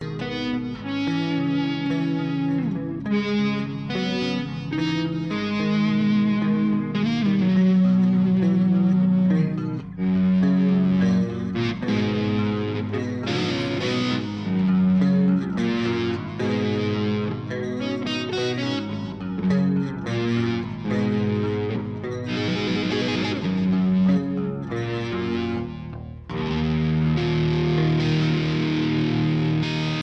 Bass Guitar Doodle

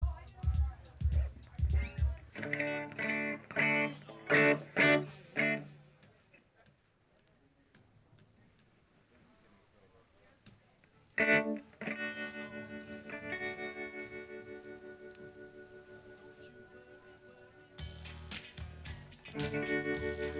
tuning

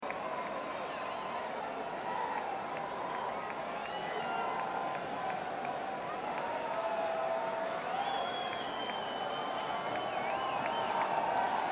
Crowd

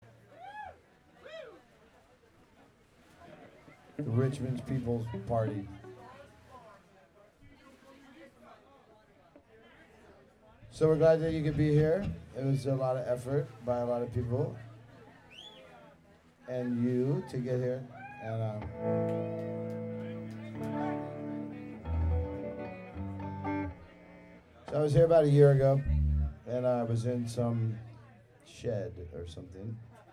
tuning intro

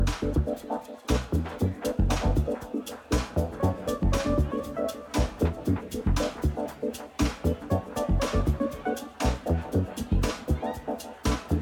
Argan Jam

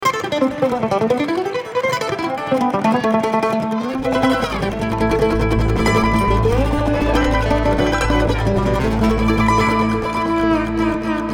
Traffic Jam

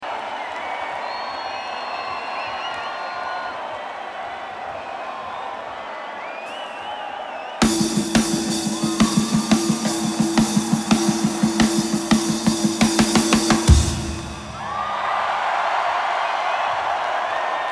Drum Solo

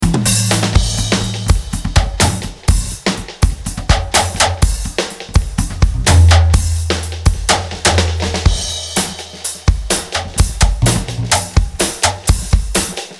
Drum Dub

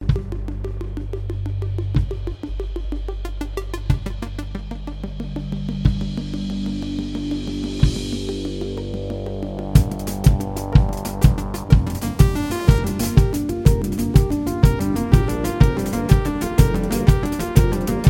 Synth Improvisation

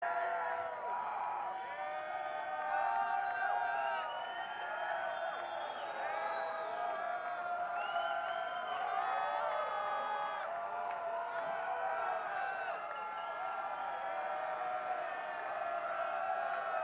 Crowd Banter

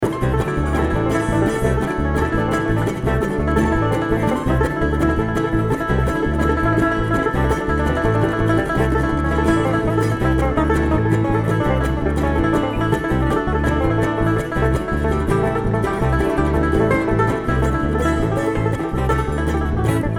Traffic Jam

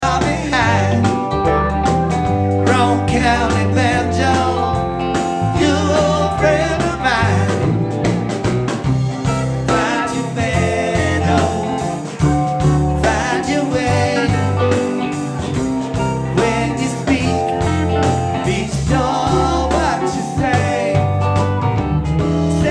Banjo

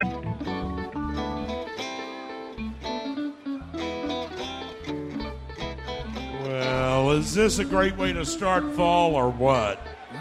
tuning

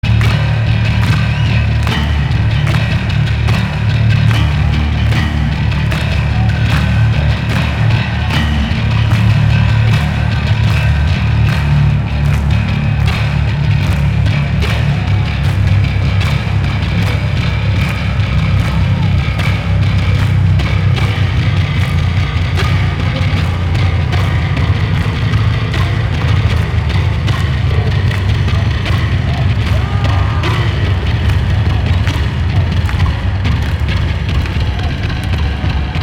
Bass Solo

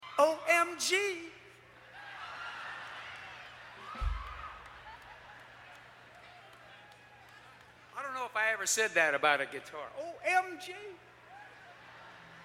Guitar Talk

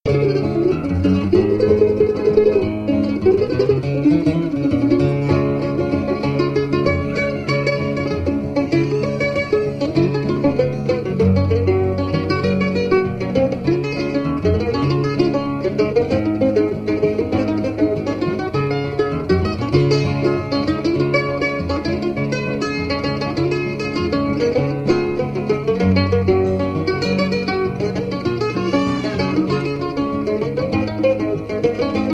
Bluegrass Stomp

